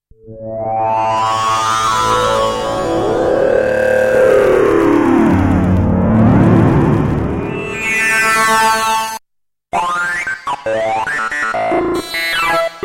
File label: TS-12; Ensoniq TS-12 digital synthesizer